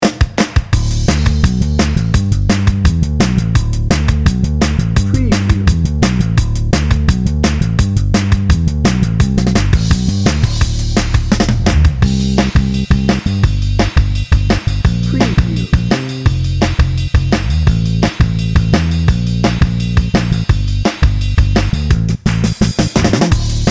cartoon critters

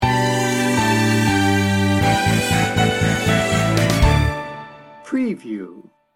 smooth and inspiring sting bumper

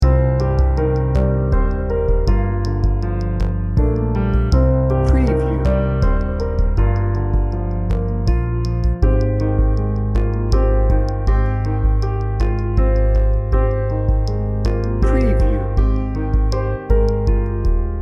heartfelt loop

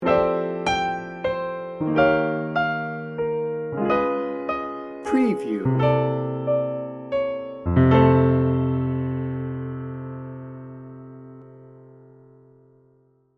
piano bar sting bumper

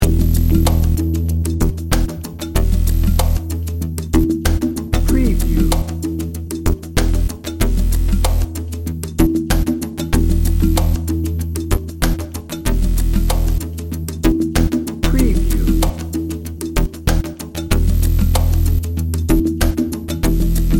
trance lucent dnb

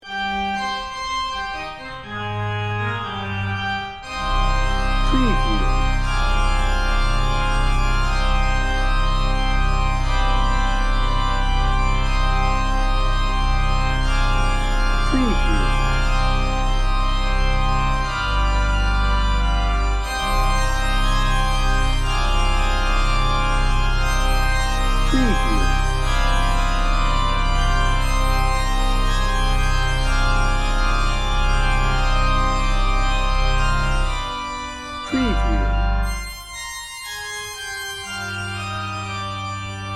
ghost stalker organ choir

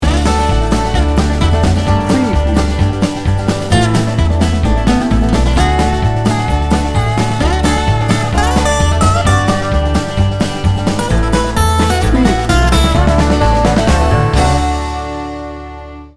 blue collar bluegrass

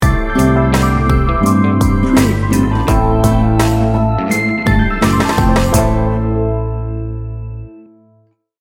slinky soul sting bumper

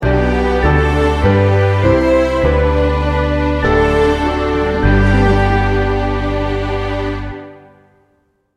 angels we have heard on high sting bumper

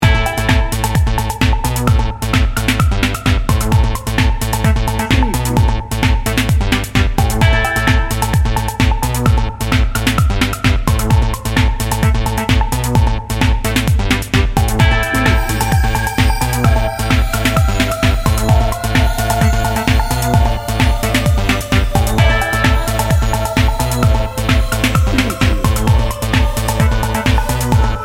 electro energy

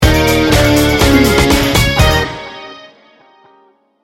party starter sting bumper

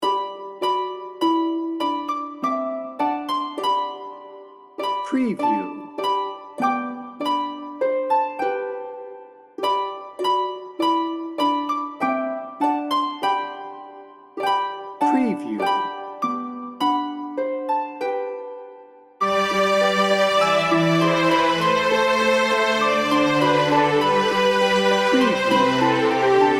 angels we have heard on high

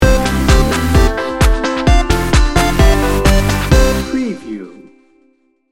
beach jam sting bumper

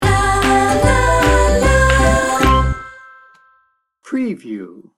ukulele kids sting bumper

